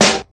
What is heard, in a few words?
Perfect Snare G Key, snare drum sound tuned to the G note. Loudest frequency: 2919Hz; snare drum samples